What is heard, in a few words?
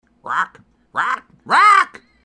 Rawk Rawk RAWK, parrot